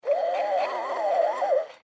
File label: Household Shower Laundry Line Rope Spool String; Bathroom